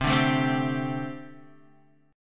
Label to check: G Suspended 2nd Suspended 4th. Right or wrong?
Right